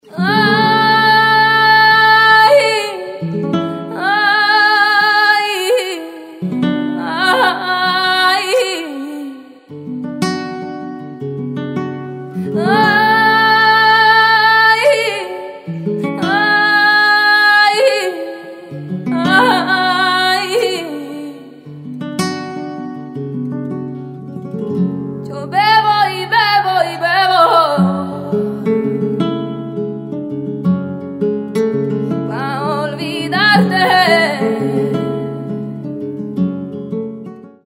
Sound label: Mobile ringtone